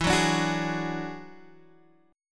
Gb 7th Suspended 2nd Sharp 5th E

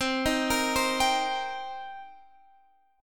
C Minor 7th C; Cm7 arpeggiated; x,15,13,15,13,15

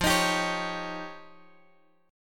F 7th Suspended 2nd Sharp 5th, Guitar, DADGAD; F7sus2#5; strummed; 15,18,17,18,x,x

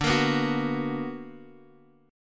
B Minor Major 7th

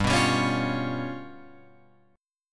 G 7th Flat 5th, down, Guitar, Open F, G7b5 chord; strummed

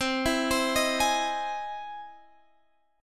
E Augmented Major 7th C

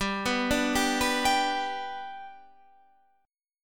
G Major, Guitar, Half Step Down

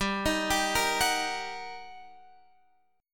G Minor Major 7th Sharp 5th, down, Guitar, Open F; GmM7#5 arpeggiated; JGuitar

